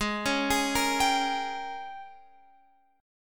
G sharp Major 7th Suspended 2nd Suspended 4th, Guitar, Open F; arpeggiated; x,15,13,15,13,14; G#M7sus2sus4